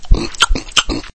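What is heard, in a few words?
eating with chomping and saliva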